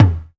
smooth tom drum single hit e key, tome drum sample tuned to the E note. Loudest frequency: 279Hz